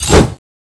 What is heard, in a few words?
water knife slash